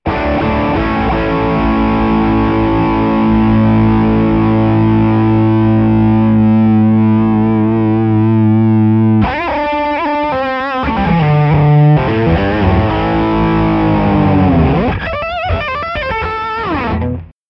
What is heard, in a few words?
bluguitar amp1